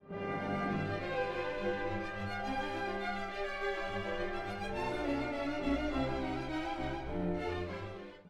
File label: Orchestra; KV262 Kyrie